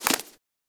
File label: foley idle ear flap; Enemies; Warframe; Catbrow